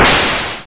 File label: fire hit; lucius demake